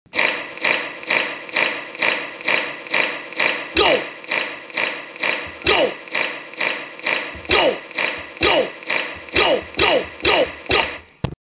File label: clap